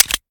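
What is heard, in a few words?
pistol reload empty fast mags mag out; gmod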